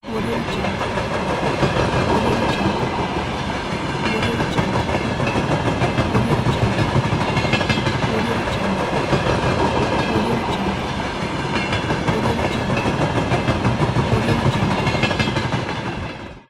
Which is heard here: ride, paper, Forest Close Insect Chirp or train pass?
train pass